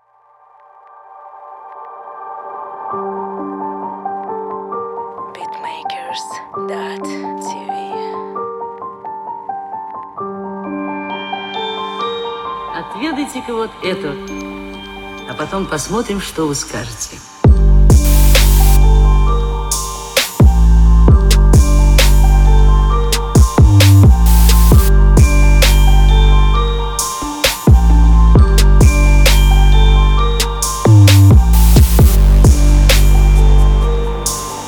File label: Cloud Rap Trap Atmospheric Rap